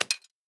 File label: salvaged axe tap; Rust